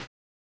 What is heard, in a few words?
LO FI HH; USB Soundscan vol.11; AKAI